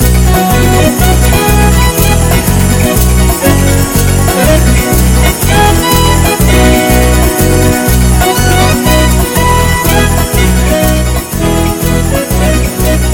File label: Fast Samba Sounds